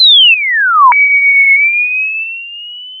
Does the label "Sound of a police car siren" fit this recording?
Yes